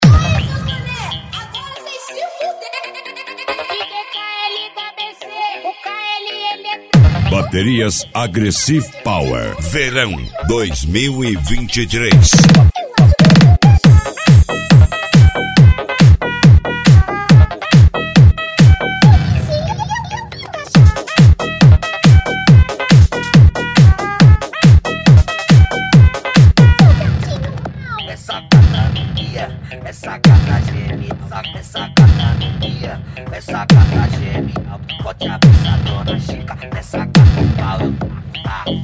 Pancadao; Baterias Agressive Power Vol 17

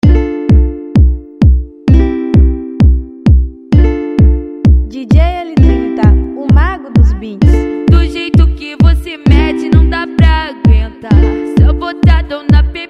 Funk MegaFunk

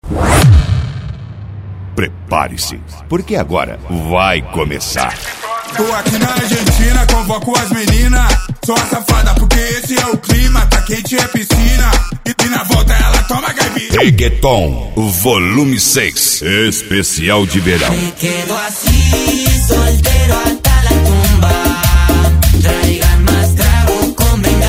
Reggaeton; Argentina

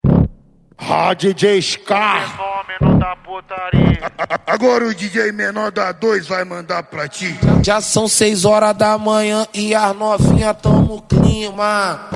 Funk

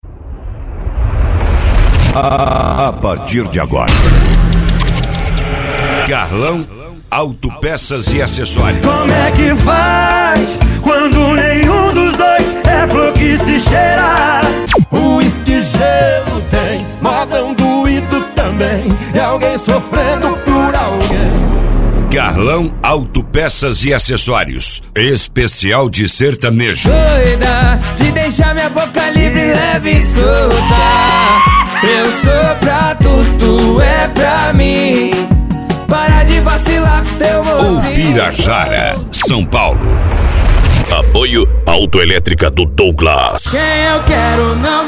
Sertanejo; Carlao Auto Pecas e Acessorios; ubirajara sp

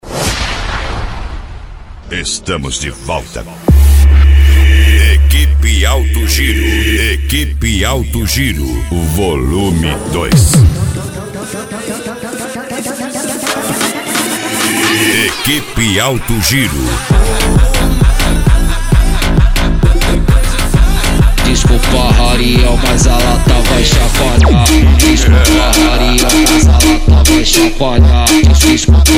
Funk, Sertanejo, Hip Hop; Equipe Alto Giro Vol 02; Sao Borja RS

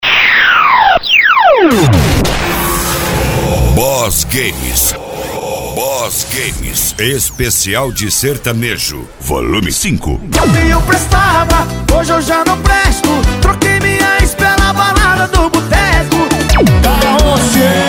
Sertanejo; Boss Games Vol 05; Cassilandia MS

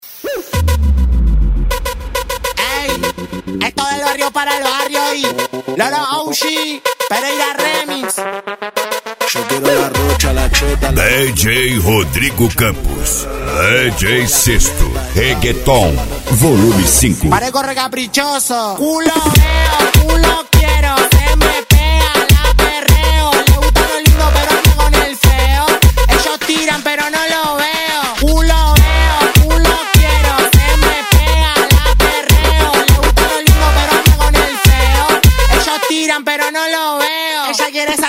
Reggaeton

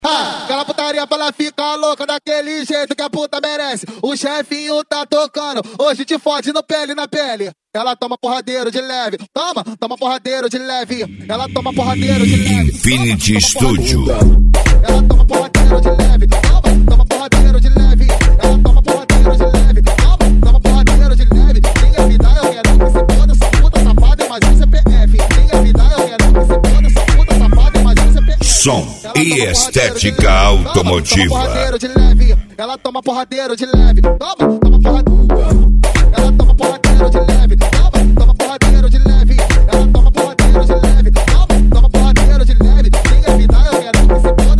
EletroFunk Funk; Infinity Studio 2024

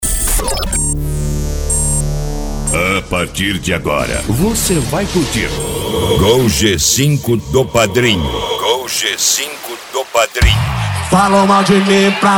Piseiro Sertanejo

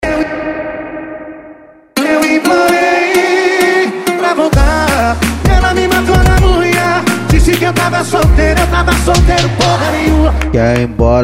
Funk Sertanejo; Sao Borja RS

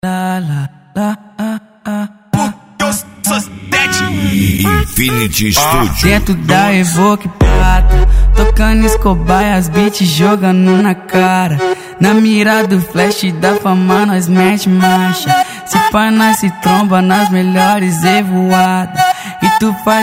EletroFunk Funk; Infinity Studio; Itaja GO

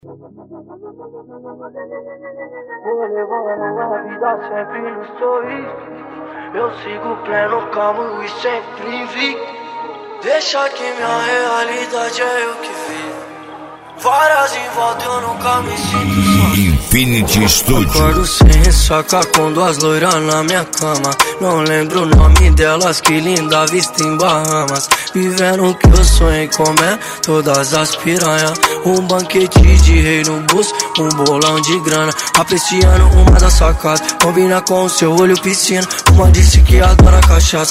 EletroFunk Funk; Infinity Studio 2024

